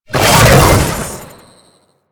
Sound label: Teleport; Warframe; Captain Vor